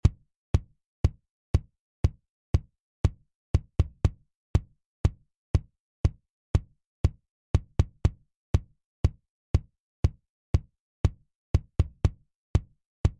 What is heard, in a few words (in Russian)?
parallelnaya kompressiya